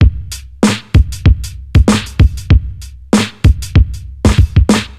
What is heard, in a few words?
96 bpm breakbeat c key; tuned drums c key